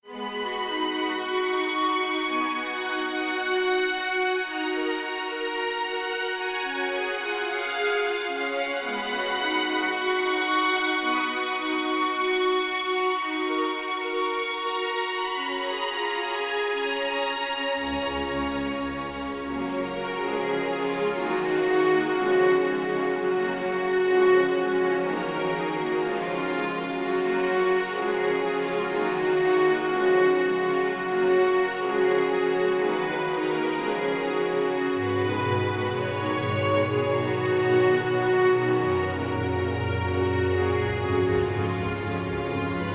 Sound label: Strings Mix